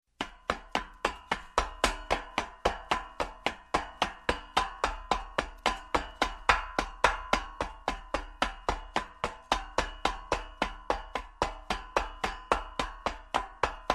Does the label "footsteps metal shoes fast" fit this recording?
Yes